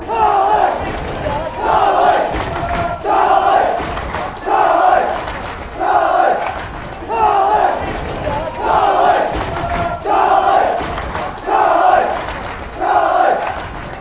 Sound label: claps